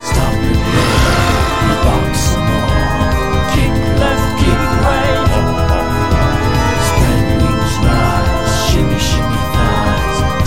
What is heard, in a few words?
Jig Ringtone